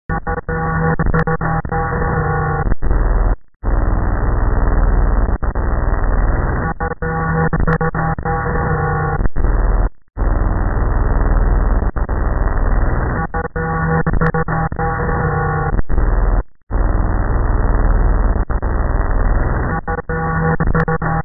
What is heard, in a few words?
radio; Milk outside a bag of milk... OST (2021)